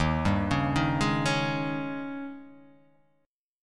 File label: D sharp 11th D sharp